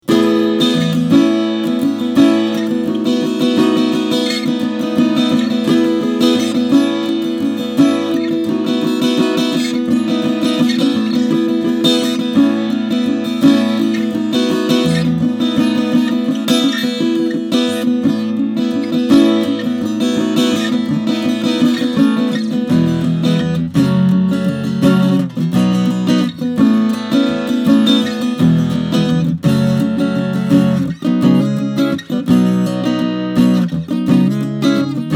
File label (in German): Traveler Guitar Redlands Dreadnought Strumming Mikrofon